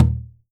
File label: OKE-DO, JAPAN PERC; AKAI S6000 CD-ROM; WorldSounds